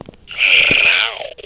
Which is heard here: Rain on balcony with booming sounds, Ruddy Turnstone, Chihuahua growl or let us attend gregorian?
Chihuahua growl